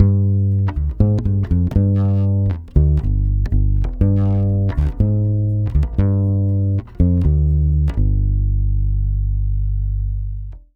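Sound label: 120 BASS G minor 7, Brazil Bossa; USB Soundscan; AKAI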